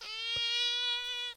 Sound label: fox; Animals